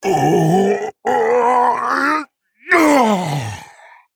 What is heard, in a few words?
Male Tauren Death